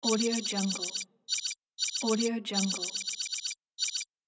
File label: computer data beeps